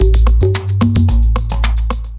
mello percussobass, 110 bpm; 101 to 110 bpm

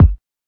kick

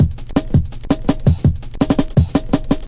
rolling lopass break

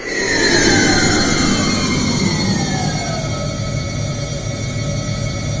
Electro FX; Ueb HE Electro FX

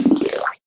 cyborg Leg It

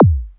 psytrance kick; Kicks; single hits

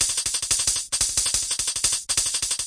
180 naughty hats, drum loops and breaks

